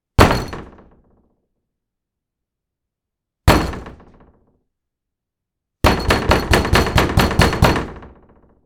Aggressive Door Pounding

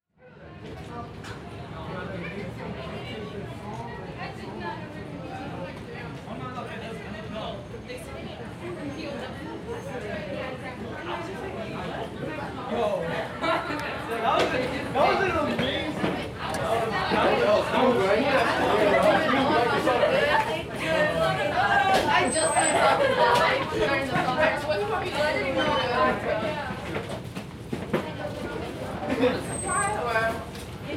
Ambient Classroom Chatter

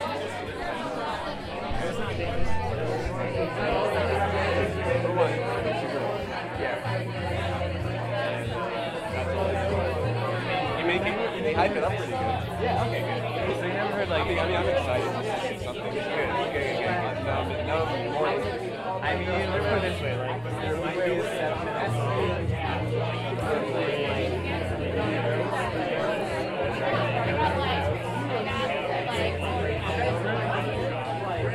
College Crowd Noise

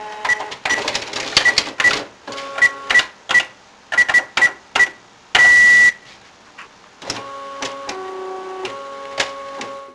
Fax

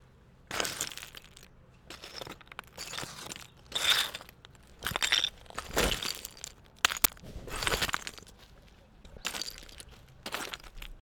Rubble Sounds

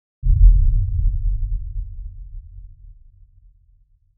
Low Movie Boom

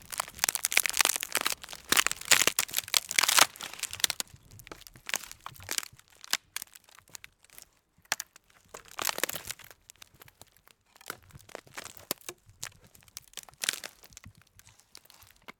Branch Crack

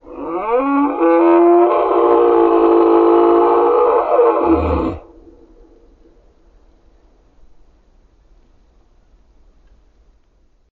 Wildlife creature warning howl

